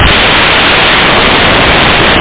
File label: snare